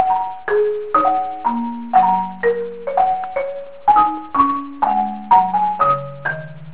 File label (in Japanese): mokkin; kids